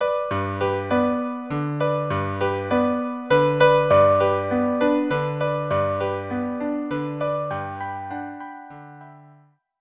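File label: lullaby violin piano in G; Brahms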